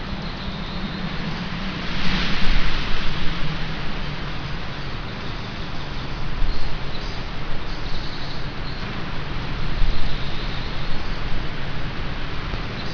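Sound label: wood ambience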